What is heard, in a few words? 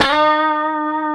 E 4, COMPR STRAT, Guitars; Spectrasonics; Hans Zimmer Guitars VOL-1